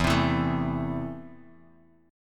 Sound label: E Suspended 4th Sharp 5th, Guitar, Half Step Down